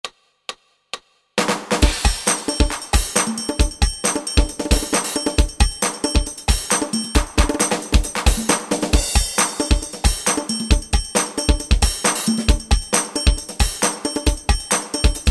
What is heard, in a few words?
dance 8 beat